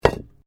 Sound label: Brick Medium Hollow Fall; Material components for constructing more complex sounds